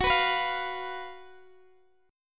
F sharp Major 7th Suspended 4th F sharp, Guitar Open D; chordshape; down